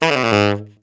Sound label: riff, tenor sax